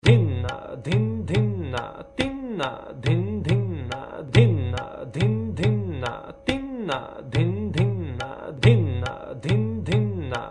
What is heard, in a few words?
tala jhap